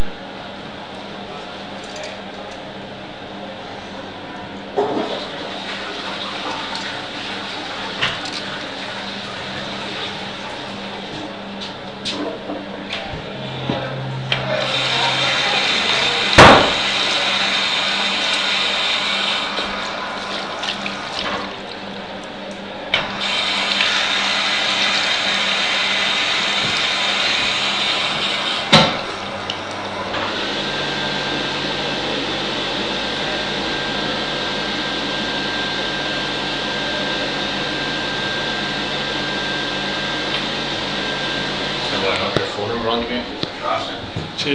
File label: gents toilet at cafe; leaf cafe